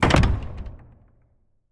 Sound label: church door closed; DeadFright